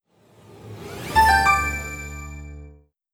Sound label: Power On, phonetones; Metro